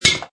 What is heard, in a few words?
Metal Ric; Metallic; Multimedia; Lynne Music